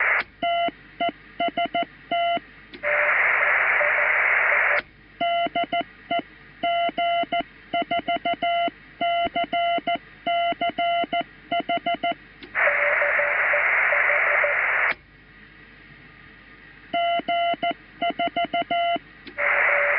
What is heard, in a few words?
test cw; g4cch; 23cm